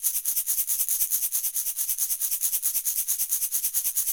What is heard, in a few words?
shaker; Golden Crayon Sounds